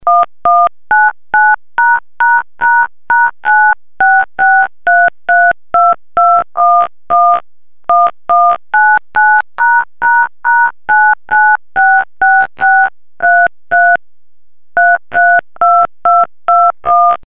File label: Baa, Baa, Black Sheep, touchtones